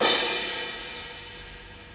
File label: Crash Single Hit A Key, crash cymbal drum sound tuned to the A note; cymbal drum samples; single hits one shots